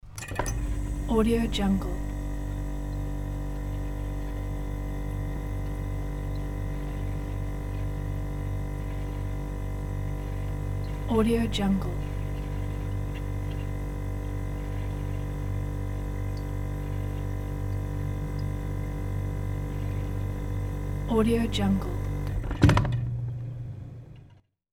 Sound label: fridge engine cooling unit hum starts and stops